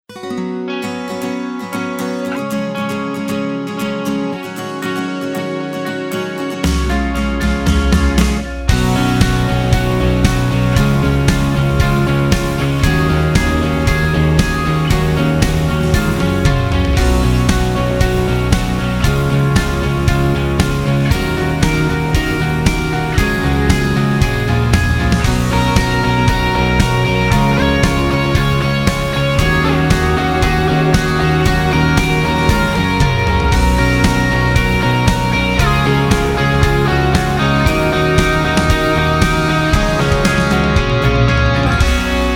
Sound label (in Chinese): 吉他 Electric Vintage; Session Guitarist; Komplete